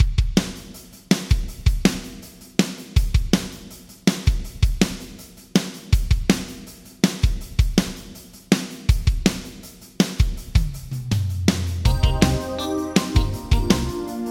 Drums Yamaha ProR3 Beauty Plate; Vintage Digital

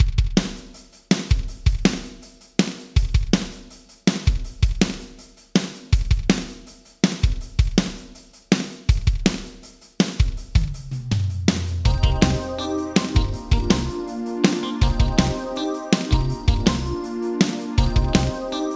Drums Yamaha D5000 Fast Wow; Vintage Digital

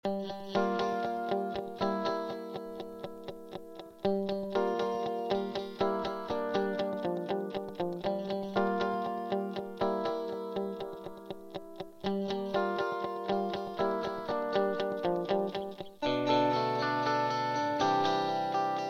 Guitar Dynacord PDD 14 Clean Delay; Vintage Digital

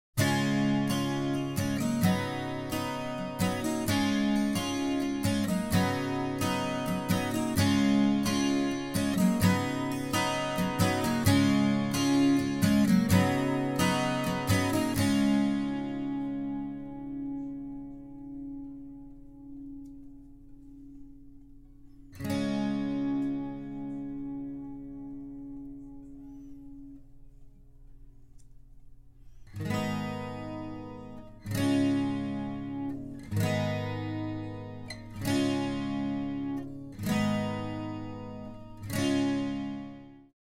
Acoustic Guitar Juno; 112db mikron chorus; 112db mikron chorus review

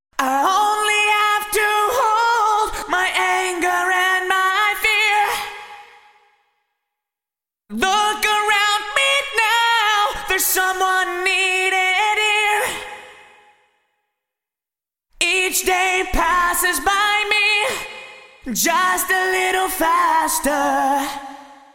Vocals Bricasti Plates Vocal Plate; Vintage Digital

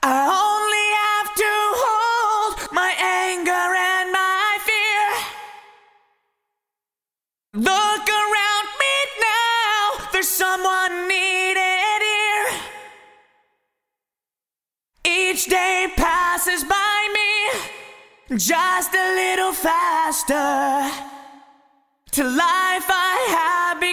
Vocal Eventide H8000 Vox Plate; Vintage Digital

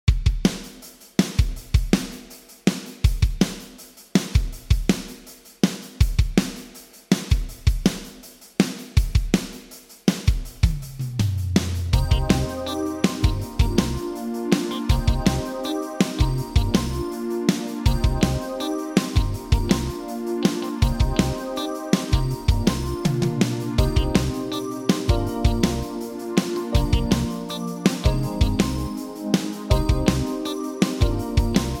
Drums Eventide H8000 Stereo Plate; Vintage Digital

